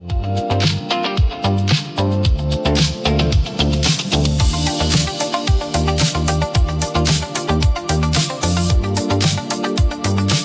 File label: cozy shop, business music; business music pack